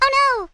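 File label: ice break; QZDoom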